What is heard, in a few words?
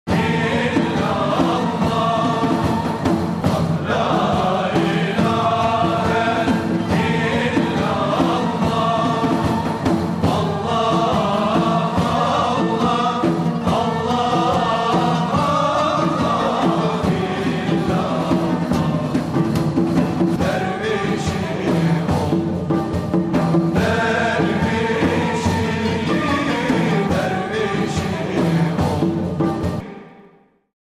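Sufi Song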